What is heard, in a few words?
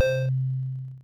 synth, amiga med